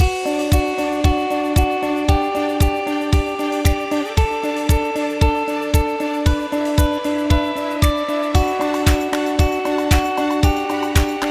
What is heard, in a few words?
Upbeat corporate inspiration loop; Korporativnaya muzyka